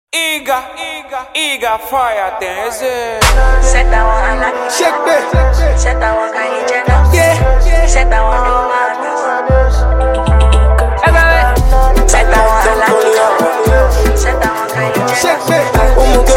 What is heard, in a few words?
amapiano remix